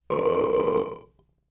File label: Man Burping